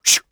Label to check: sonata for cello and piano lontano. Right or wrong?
Wrong